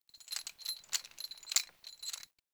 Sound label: Tent Ring Jingle, interior; Ambience; Soul Plane